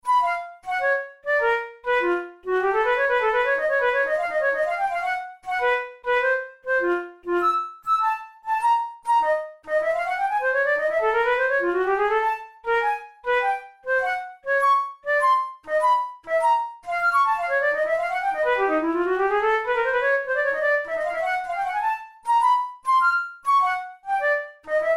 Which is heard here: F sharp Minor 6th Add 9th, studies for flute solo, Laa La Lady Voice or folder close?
studies for flute solo